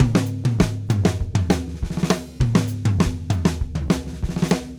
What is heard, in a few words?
Drumset Groove; Sonic Foundry (Sony Creative Software); Joe Vitale Latin Percussion; Grooves